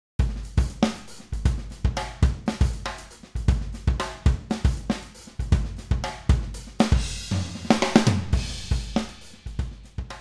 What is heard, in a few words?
raw groove; toontrack